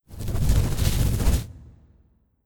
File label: Abyssalflame Whirl FIRE